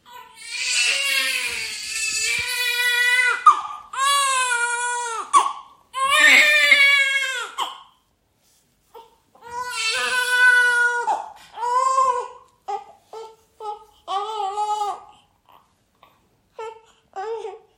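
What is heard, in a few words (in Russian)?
новорожденный ребенок в возрасте менее одного дня жалуется и плачет кричит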